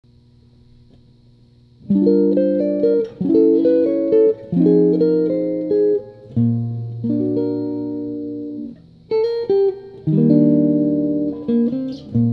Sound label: Bear Claw Electric, guitars